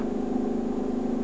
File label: motor; quake2; mnhcoop